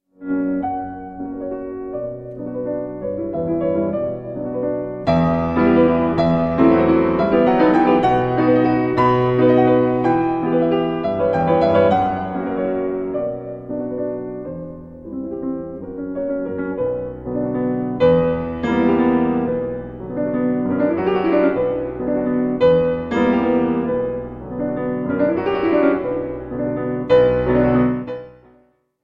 4 impromptus for piano d899 op90 no2 in e flat major; franz schubert